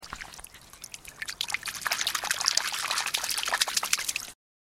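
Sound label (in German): wasserplätschern im fluss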